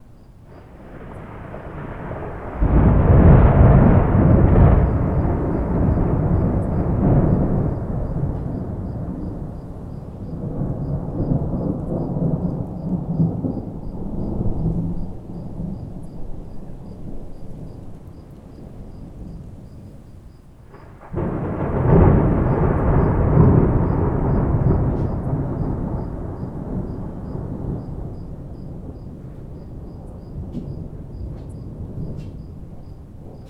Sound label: thunderstorm; ABQ